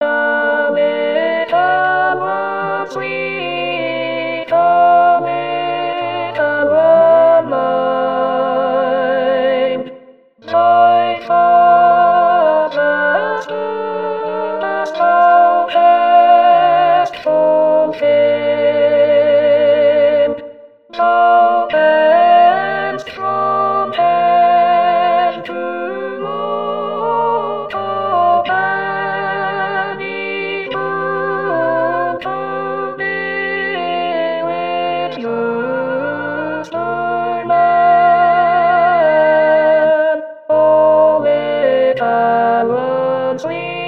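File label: O little one sweet Alto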